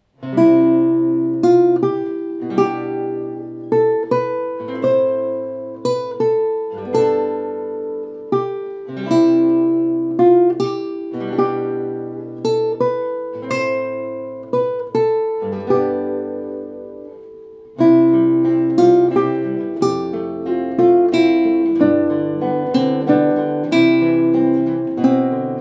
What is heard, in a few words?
Catalan Folk Song, guitar